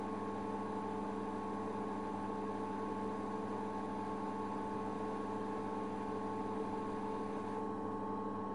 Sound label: pc noise